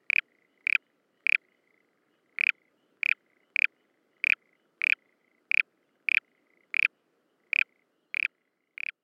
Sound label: frog on mic